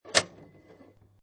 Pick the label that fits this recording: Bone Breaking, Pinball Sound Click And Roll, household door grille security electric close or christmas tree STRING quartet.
Pinball Sound Click And Roll